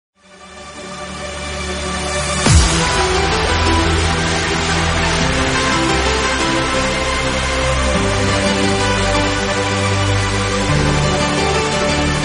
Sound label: partyalarm